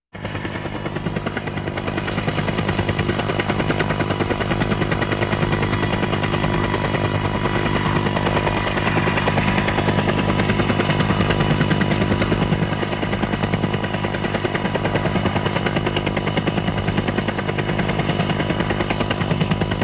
Walking Machinery Trucks

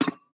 Peg Leg Footstep

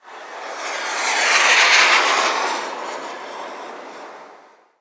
Rocket, Slow Pass By; gun and weapon sounds; captain audio; fully loaded

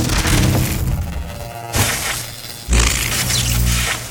Lightning Enemy Attribute Loop; AudioWizards

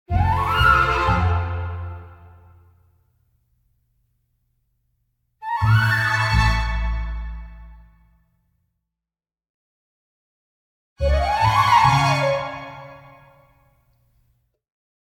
Orchestral Jingle Positive; boom library; The Interface

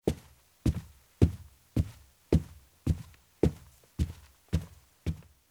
footsteps; DeadFright; guitarrist

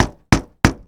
Plane P40 Hit Bang Canopy; SFX Kit; Airplanes

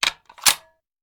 Rifle Foley; Sound Ideas; Guns

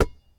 Street Ball Rebound Grab; SFX Kit

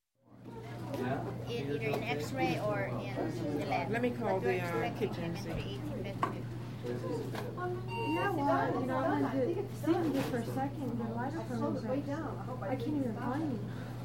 Hospital Nurses Station Voices, Beeps, Punch; Punch Clock; Sound Ideas 2000 Series

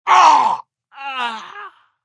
male pain death; firefight

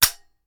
Machine Gun Foley; Sound Ideas; Guns

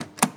65 Corvette Door Open, Automobiles

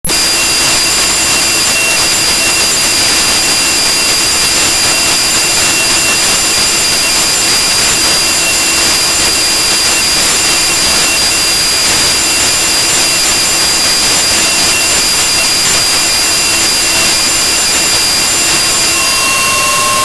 Alarm Clock Bell; 1001 Sound Effects; Foley

